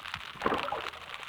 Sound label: fish jump; soniferous; Gilligans Island